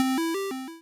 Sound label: win; ludum-dare-55